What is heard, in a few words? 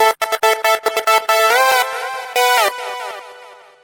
techno synth, bass loopes; lmms